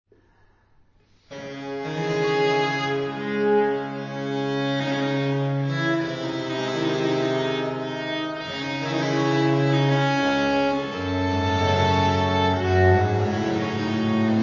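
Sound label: D minor Prelude; Marais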